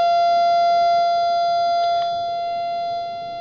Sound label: HP Audio Tone